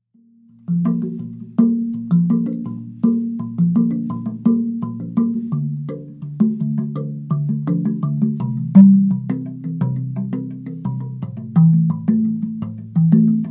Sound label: tongue drum; cosma